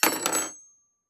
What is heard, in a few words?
Utensils Scattering; Fairlight Sound Lib